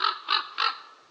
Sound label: Small ambient crow; STALZONE